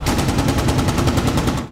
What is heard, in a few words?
30mm cannon, gunfire; Helicopter